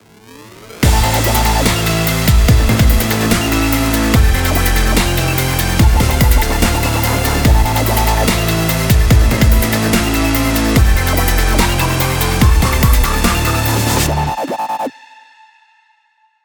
action sports dubstep

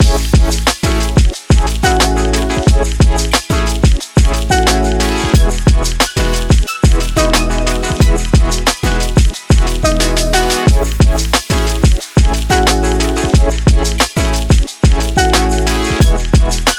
travel summer pop

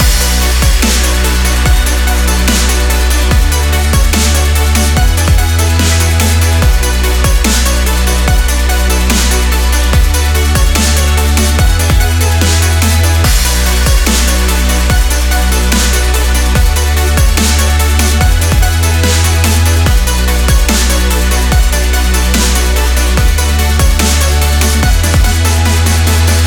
powerful tech and extreme sport melodic dubstep

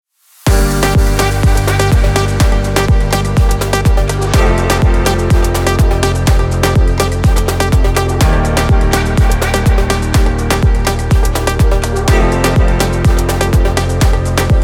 adventure trip

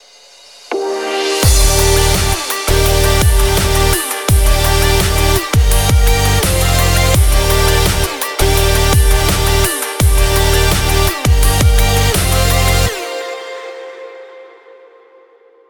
high tech robotics

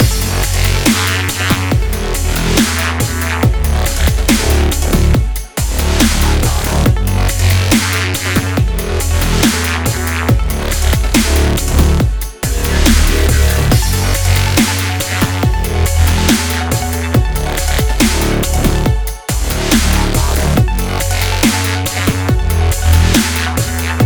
future dubstep

